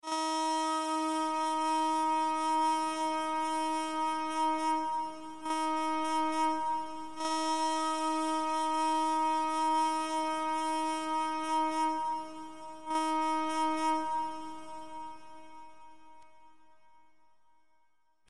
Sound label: Affirmative; colregs